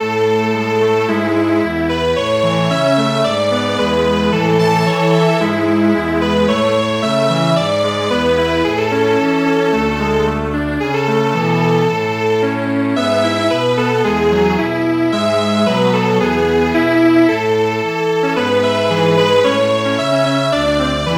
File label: Japanese March Strings Quartet